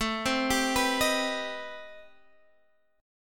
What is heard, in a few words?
C Minor Major 7th, A, chord